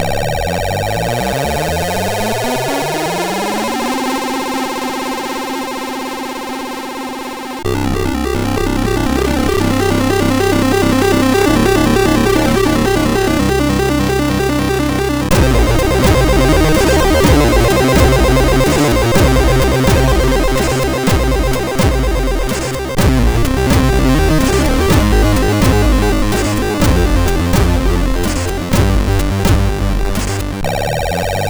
MOS6581; MOS6581R2

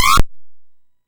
MOS6581R2, sid; Future Knight

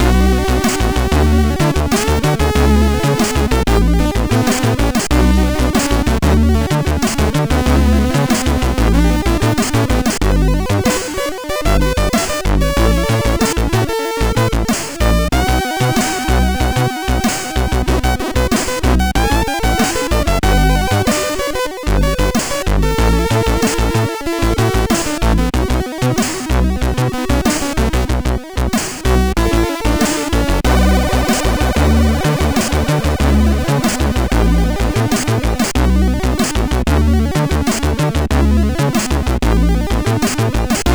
Intro Tune, MOS6581R4; sid

